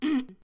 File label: throat clearing